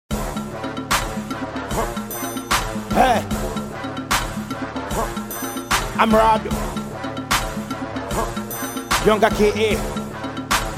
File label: Beat